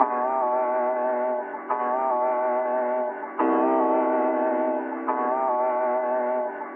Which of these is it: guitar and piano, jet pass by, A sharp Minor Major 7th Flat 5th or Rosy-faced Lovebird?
guitar and piano